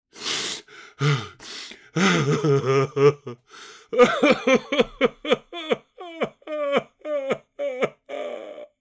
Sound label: Aged man heartbroken sobbing